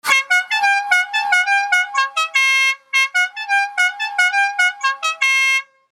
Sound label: Horn